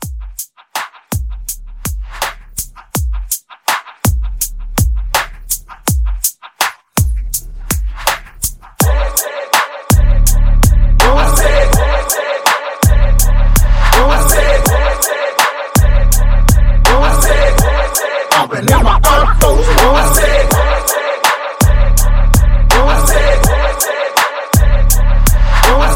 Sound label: Club Intro